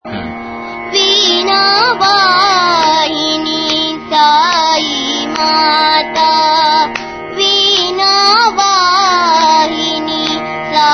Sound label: Bhajan; VeenaVahini